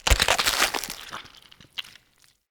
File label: Pumpkin Destroy